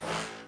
Stabz

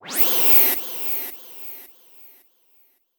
SFX

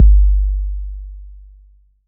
808's

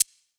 shaker one shot; Percs

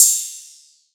Cymbal

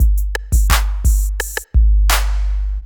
Loop

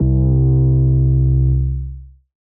808s

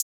HiHats, Closed Hats

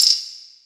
shaker wavy

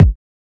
kicks

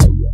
Hit

